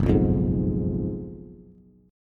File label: Gb Major 7th Suspended 2nd Suspended 4th, down, Bass; strummed; JGuitar